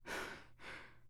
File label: Wrap Forward; ESAN VO Sound Schemes; ESAN Horror pack